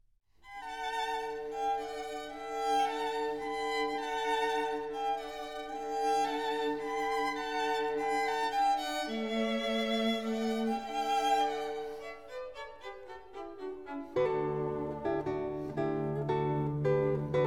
Quintet D major Fandango Pastorale; Quintet No4

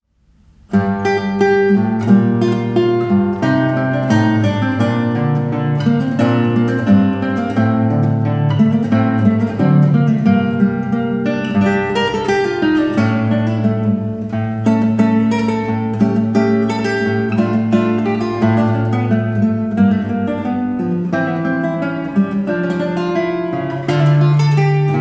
Bourree; Weiss

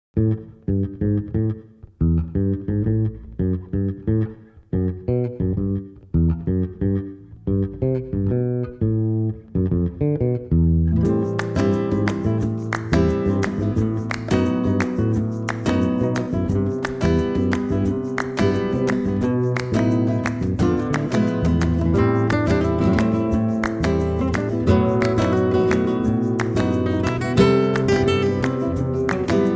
Rumba

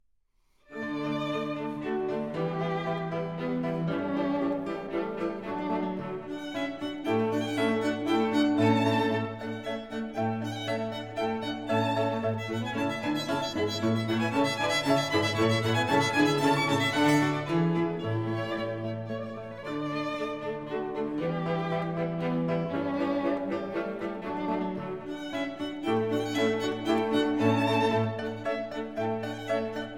Quintet D minor Minuetto; Quintet No1; G445

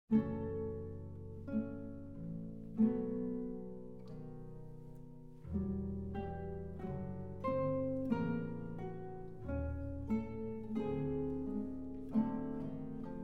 Guitar Orchestra

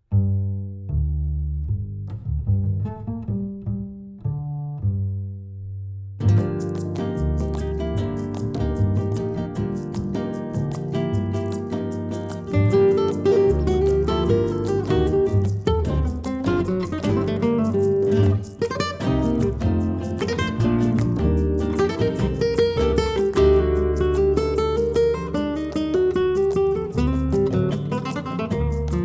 Sonata Flamenca Rumba

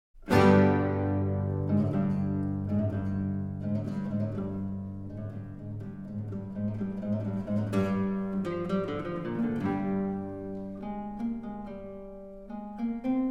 Guitar Orchestra, Danza; Manuel de Falla; Danza del Molinero

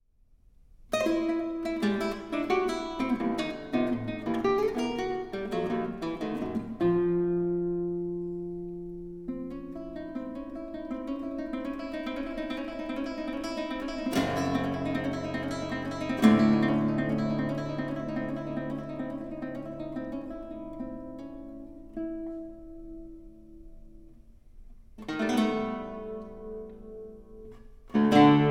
guitar; Central Guitar

